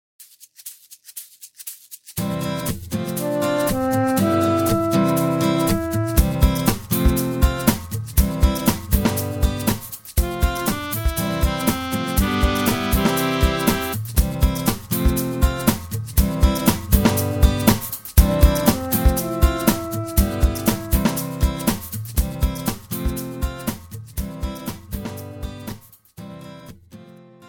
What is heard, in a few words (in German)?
Jingle Projekt; steinhundert